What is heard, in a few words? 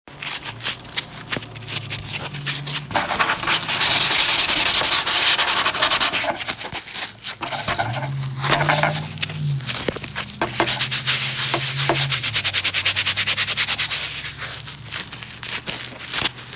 Sponge